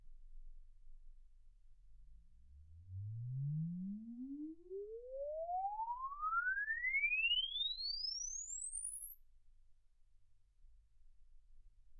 exp swept sinus 10Hz 24kHz

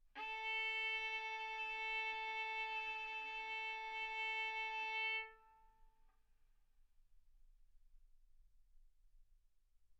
Violin arco mf sul A A4 B4 stereo

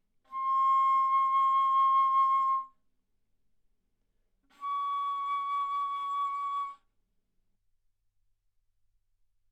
Bass Flute ff C6 Db6

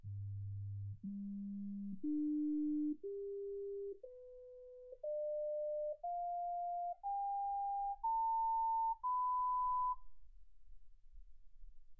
sinus tones 48kHz

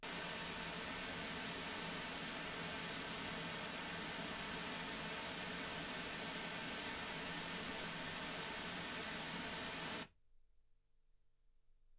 pink noise 48kHz

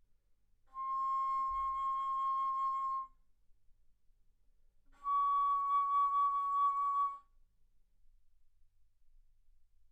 Bass Flute C6 Db6; ff

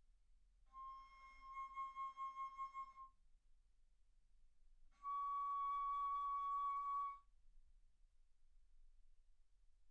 Bass Flute ff C6 Db6

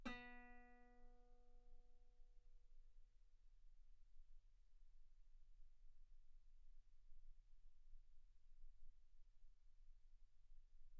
Guitar ff sul B B3

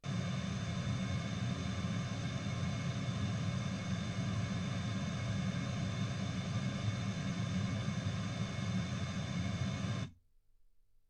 pink noise 48kHz

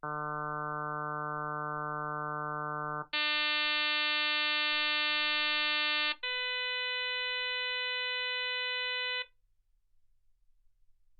harm sinus 48kHz

